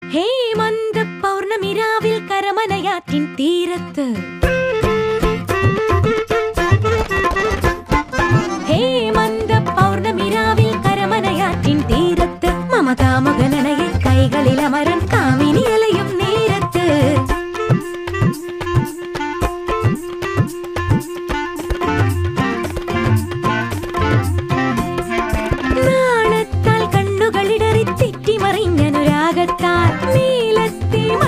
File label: Female Ringtone